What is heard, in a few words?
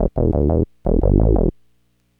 Synth Bass